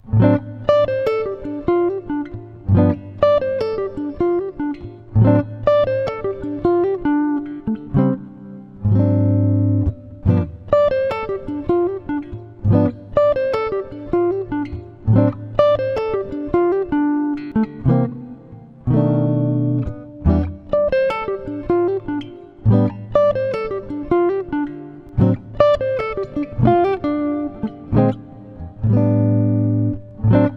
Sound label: Chord Melody; instructional materials; jazz tunes